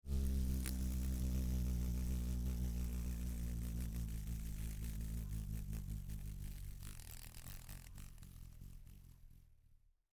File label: hornet wings close cold; shershnya